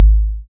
BD, BD Dance; Sound & Vision; Gigapack I CD 1

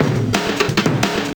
175, Breaks; Drum&Bass; EZ Rollers

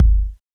BD amiga 8, DRUMS; Polyend; Legowelt

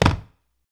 STAM, Human Perc, Body Perc

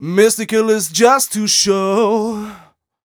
m 127 mel a, Vocals

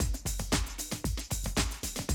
Classic Drum Loops

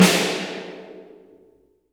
NCSNC2RFSL, drums; Best Service; Real Mega Drums VOL-1

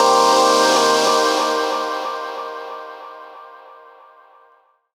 PAD SHUSH FX; Rawcutz; Nu Forms

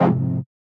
Fm, Chords; M8; Electronisounds

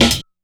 hihat, Trancefunk 134 F#min; Big Fish Audio; Hardcore Breakbeat Action; Trancefunk Mutator

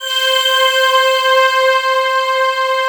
FINAL PAD; ENDINGPAD

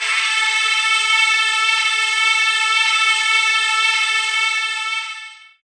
ethno, ethnic; Techno Trance Essentials

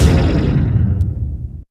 hit, IMPACTS & HITS; Techno Trance Essentials

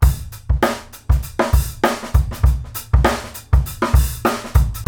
GROOVE

